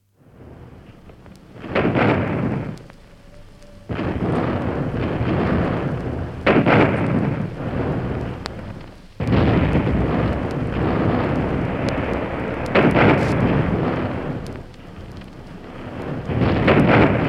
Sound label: Sound Of, Sound of heavy gunfire; from 78 disc; Battlefield; BBC